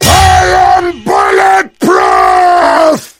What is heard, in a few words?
metal skin cast; i am bulletproof; heavy